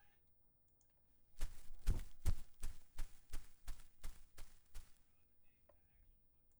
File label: Footsteps Grass